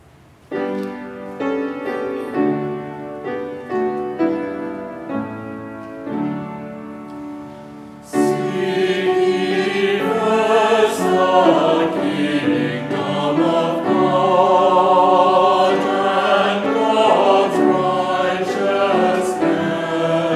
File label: Choral Call to Worship